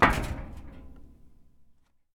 sprint metal; Escape from Tarkov

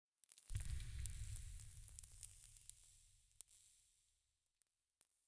rock fall; Rust

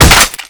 melee hit; weapons; Call of Duty Modern Warfare

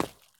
sprint asphalt; Escape from Tarkov

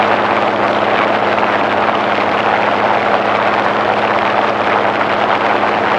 engine plane far; engines; War Thunder

